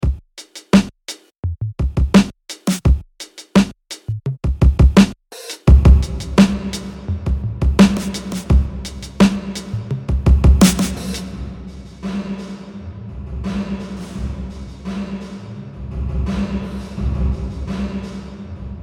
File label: hall roomworks